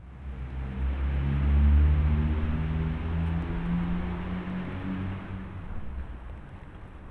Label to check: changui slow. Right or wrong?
Wrong